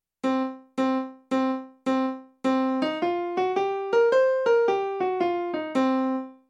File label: Blues Scale C